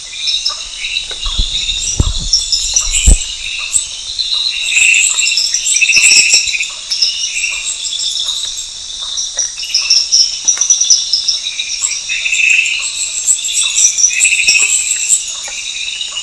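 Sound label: Dendropsophus leali, frog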